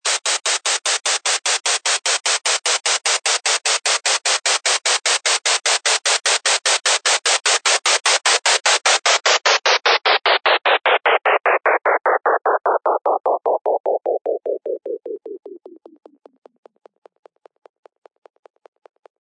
Tension White Noise Down 100 BPM; Cymatics

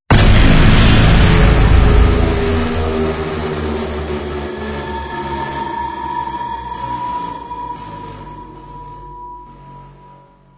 Distorting Blow

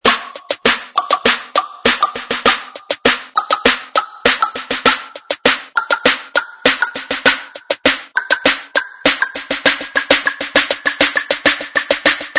Buildup Drums 100 BPM; Cymatics

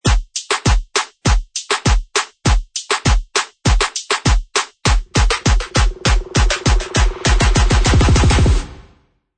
Buildup Drum Loop 100 BPM; Cymatics; FX Essentials

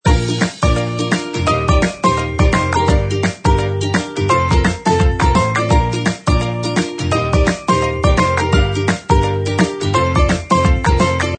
Loop; MusicalSmile

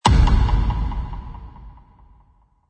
FX Impact; Cymatics; FX Essentials

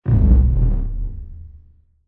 Epic Movie Trailer Wave Kick

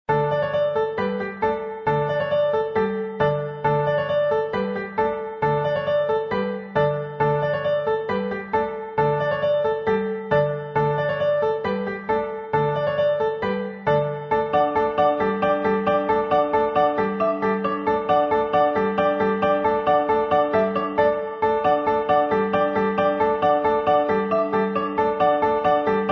Happy Piano and Strings Loop

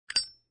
Zippo Open

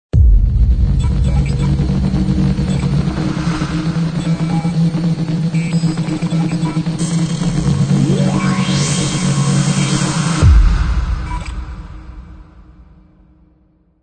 Hi Tech Logo; T-Trider; 音乐素材 高科技标志2 Hi Tech Logo 2

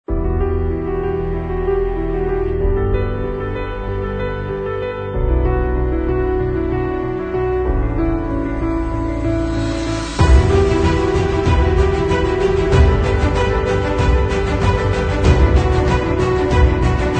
Trailer

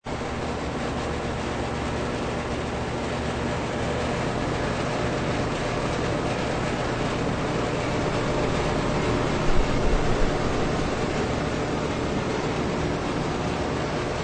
Ambience AC Unit Outside; Cymatics

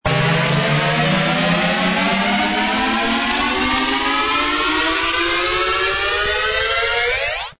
Tension Riser 128 BPM C; Cymatics

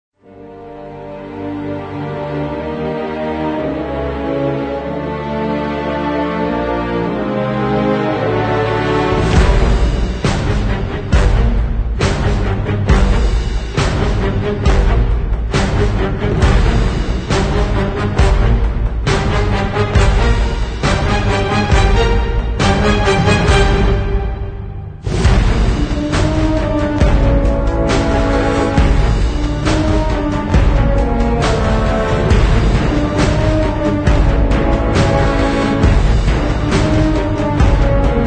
No Choir

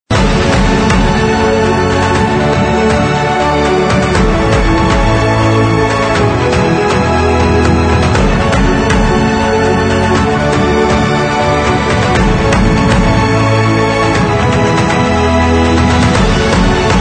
Epic Loop

